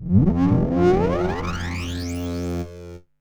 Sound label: Virus dirty Rise